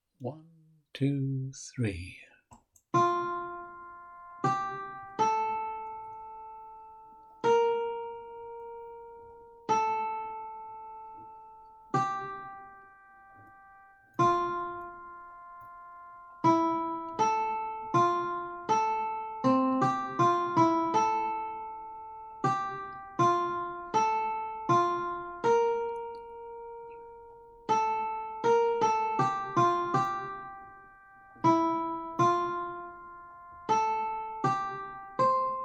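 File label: Two Minuets - Uke 1 Practice, ukulele ensemble; Two Minuets - JS Bach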